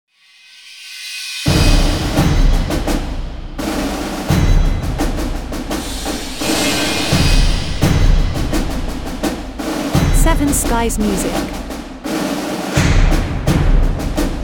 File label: Military Drums